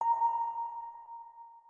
organ

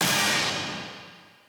stab

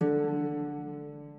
harp

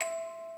musicbox

